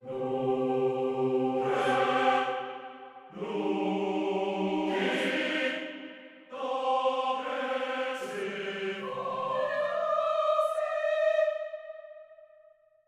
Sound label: Garden CHOIR; Garden v3